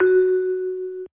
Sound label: key